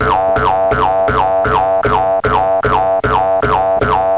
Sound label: Boing Boing, cartoons